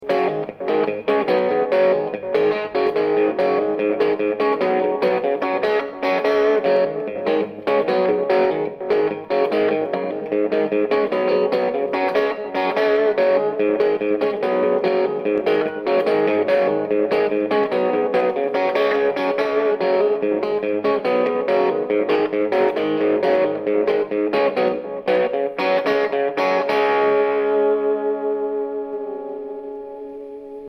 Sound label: Riff, modulation, UK MKII